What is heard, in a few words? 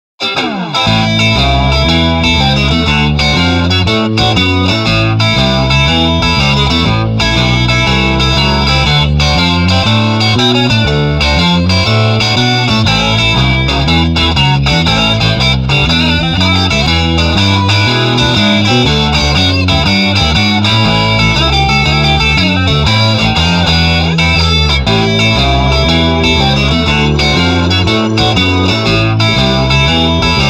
Rhythm no Drums 120